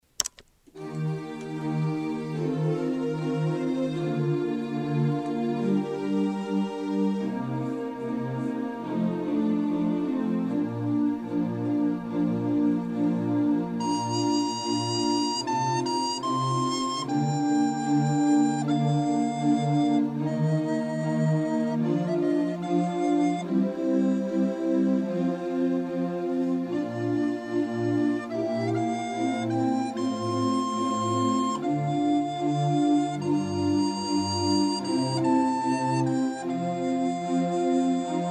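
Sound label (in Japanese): 威風堂々（リコーダー＋伴奏）; 成隣小学校 WEB音楽室; いふうどうどう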